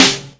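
bright snare sample c key, snare one shot tuned to the C note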